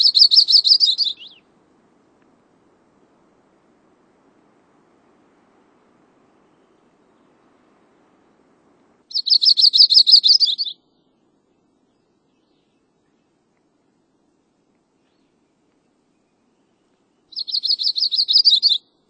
Yellow-rumped Warbler; Birds

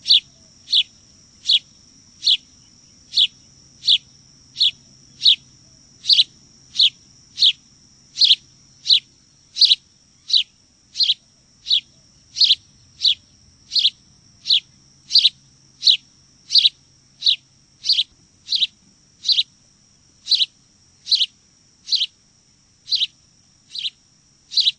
House Sparrow; Birds

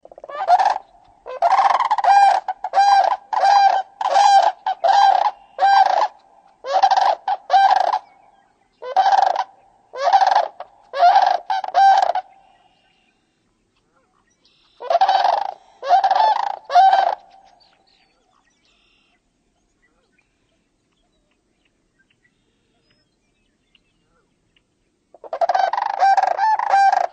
Sandhill Crane; Birds

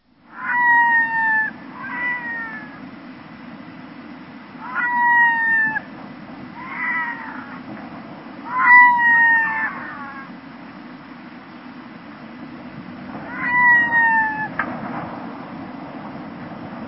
Red-throated Loon, Birds

